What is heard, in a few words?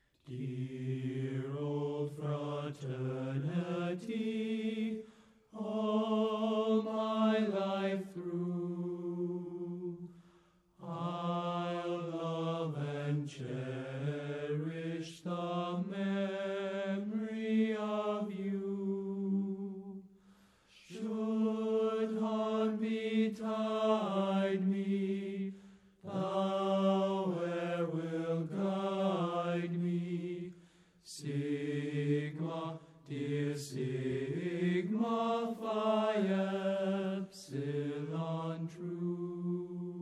anthem